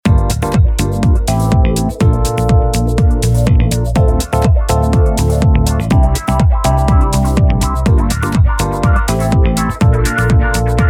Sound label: Upbeat